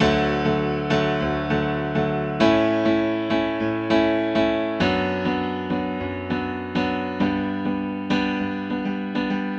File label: Grand Piano - 100 BPM (D Major); Hype; Ghosthack; Free Guitar And Piano Samples